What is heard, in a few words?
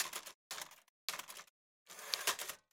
typewriter; typewriter sounds for Timothée